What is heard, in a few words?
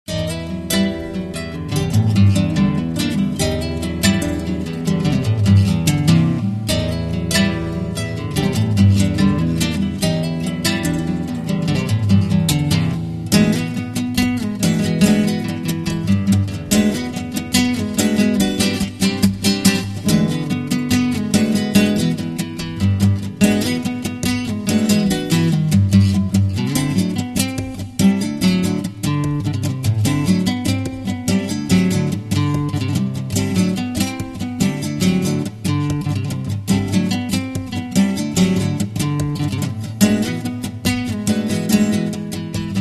acoustic